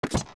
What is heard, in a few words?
steps bridge land; zelda; OOT